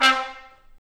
BRS TP, Roland, Stabs; Roland L-CDX-03

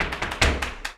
Flamenco, ZAPATEADOSOL

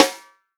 snare drum, HIPHOP SNARE CHROMATIC

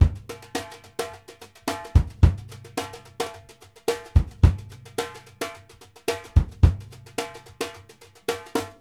VARIATION, BRUSH 108; Sampleheads - New York City Drumworks VOL-1

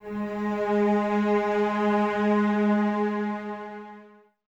CES G#3, Cellos, String Ensembles; Miroslav Vitous; 90 s Sample CDs

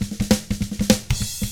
SHUFFLE, Drums; ILIO; Double Platinum Drums 1

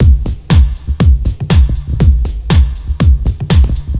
4 floor beat, trance

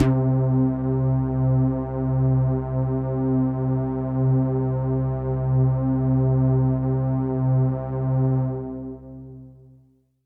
Pad C, Synth Prophet One Shots; Electronisounds

